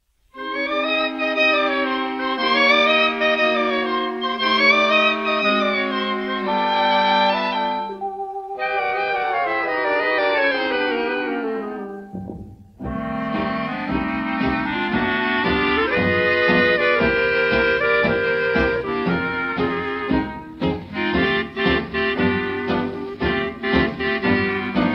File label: Dance Orchestra